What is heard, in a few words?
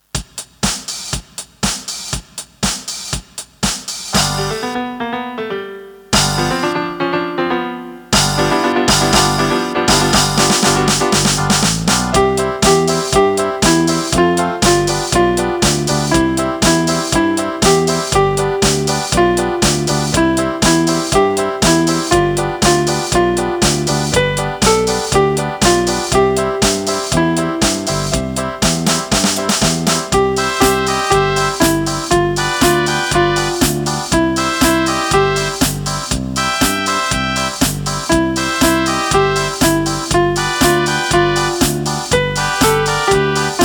Rock n Roll; Camerata i keyboard na zakonczenie roku szkolnego; koncert